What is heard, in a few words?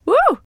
Emote Female Happy; Idle Champions of the Forgotten Realms